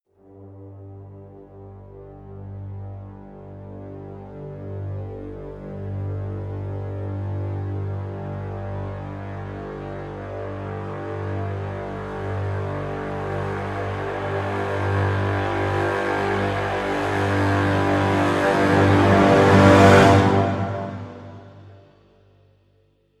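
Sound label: crescendo brass swell lo G; brand x music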